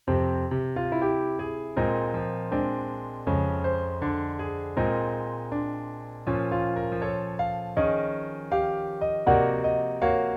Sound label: Melody in F Minor Transcription